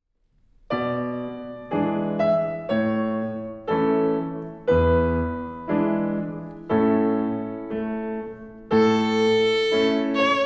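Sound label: May Song Slow Practice, Violin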